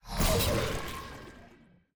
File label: action attack; vex